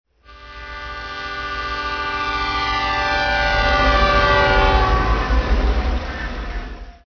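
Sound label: Diesel train passing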